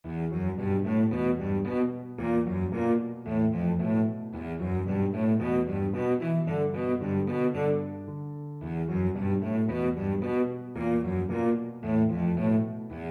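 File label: violoncello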